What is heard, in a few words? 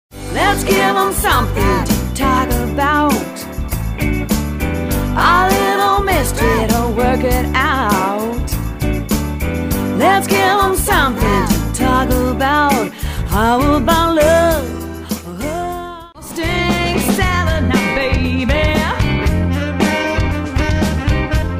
Pop